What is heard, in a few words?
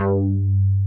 JX BEEF G3, BEEFY BS; Drums In Your FACE!